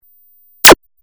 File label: Beam Laser